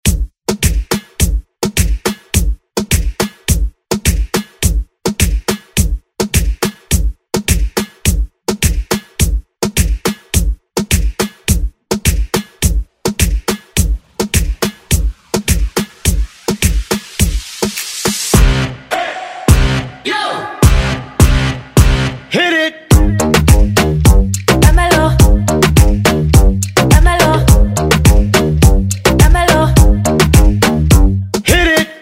Moombahton Redrum

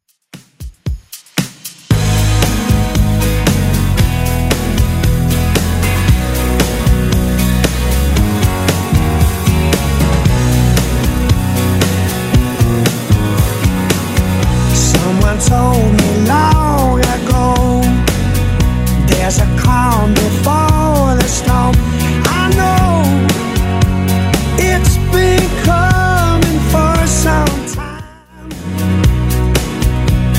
2Ks Redrum 115 bpm

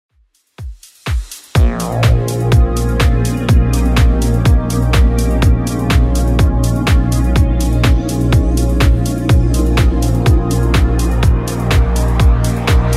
90s Redrum 124bpm

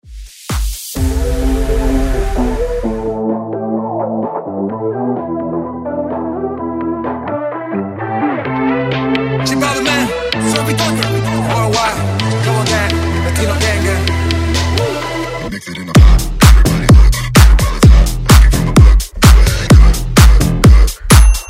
Dance Redrum

